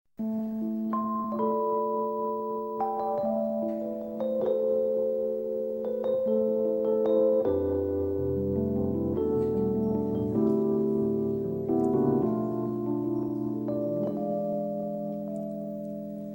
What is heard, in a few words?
Romantic Bells; meloboom